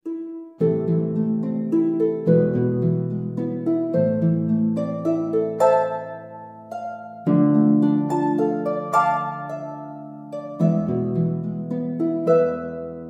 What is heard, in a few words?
Siciliana for 2 harps